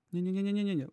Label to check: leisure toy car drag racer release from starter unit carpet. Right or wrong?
Wrong